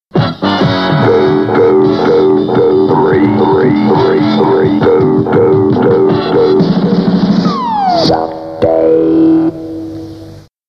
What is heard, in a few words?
Jingles